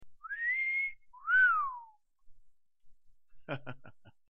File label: Wolf whistle